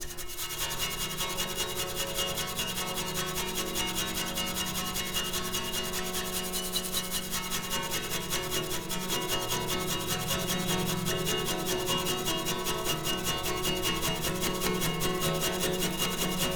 desolated strings & wood strings brush fast movement; The Soundcatcher; Desolated Strings and Wood